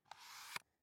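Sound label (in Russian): звук карты вставленной в слот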